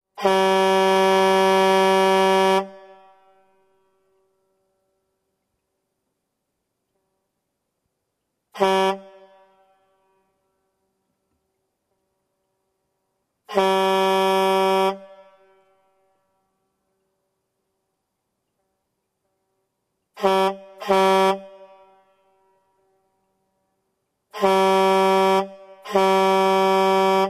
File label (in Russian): Signal klakson fury gruzovika